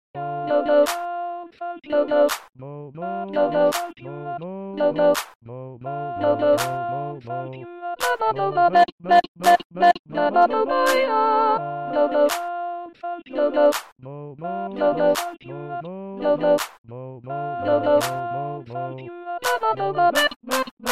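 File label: soprano 2 last 15 bars slowed down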